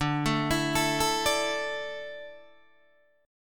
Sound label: D Suspended 2nd D down Guitar Open D